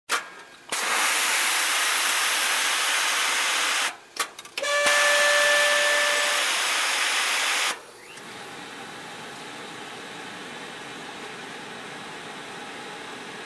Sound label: Hydraulic Machine with exhaust